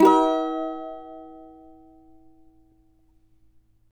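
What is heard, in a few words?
F minor, Guitars; Spectrasonics; Hans Zimmer Guitars VOL-2; CAVA CHORDS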